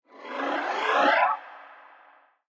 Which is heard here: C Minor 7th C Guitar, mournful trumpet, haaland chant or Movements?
Movements